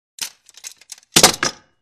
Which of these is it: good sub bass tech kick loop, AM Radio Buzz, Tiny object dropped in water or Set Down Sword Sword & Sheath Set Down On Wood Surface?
Set Down Sword Sword & Sheath Set Down On Wood Surface